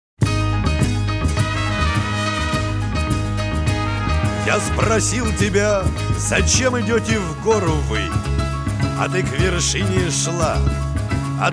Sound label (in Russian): instrumentalnyy ansambl